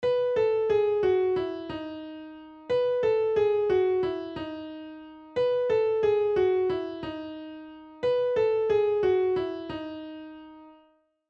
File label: KEY of E